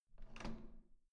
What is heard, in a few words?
door handle up